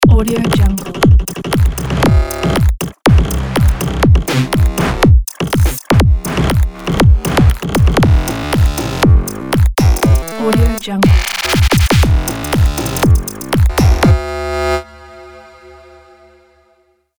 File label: electro logo